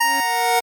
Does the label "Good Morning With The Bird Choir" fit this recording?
No